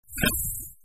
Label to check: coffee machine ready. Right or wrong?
Right